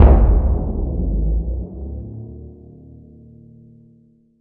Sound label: Drum Bend